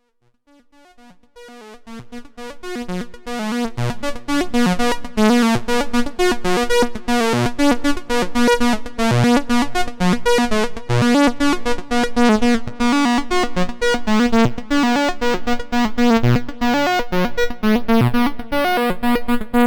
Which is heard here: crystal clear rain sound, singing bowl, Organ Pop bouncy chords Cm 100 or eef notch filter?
eef notch filter